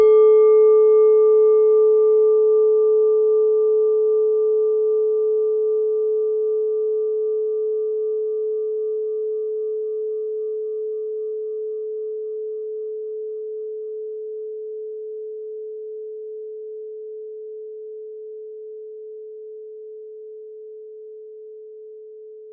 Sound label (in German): klangschale nepal, Klangschalen Nepal